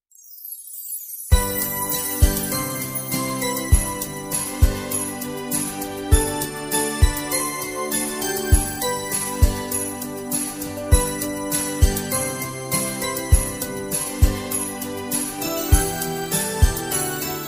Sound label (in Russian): novogodnyaya melodiya